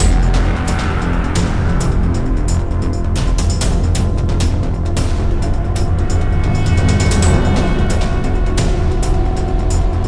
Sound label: Environment Music